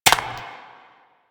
Bird Single Step, Ambience; bird three single step

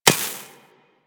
Laser Impact Metal; Surface

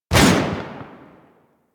Hand Shotgun Alt Shot

